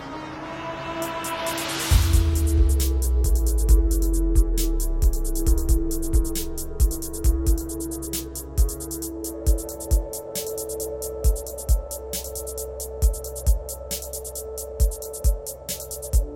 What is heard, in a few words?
Soundscape Beat